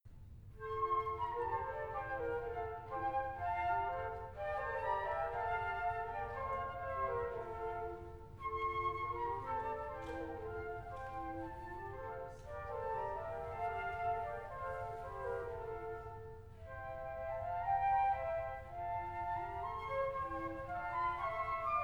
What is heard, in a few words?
flute christmas medley